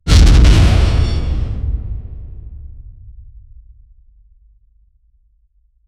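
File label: heavy demonic armored dash, metal rattle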